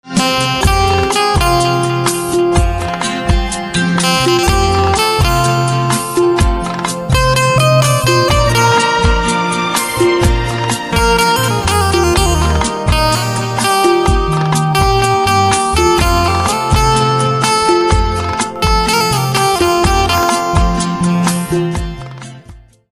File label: Banjo, instrumental ringtones